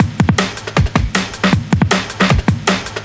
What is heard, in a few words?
drums, Hardcore Breakbeat, 157 Dmin; Big Fish Audio - Hardcore Breakbeat Action; Disconnected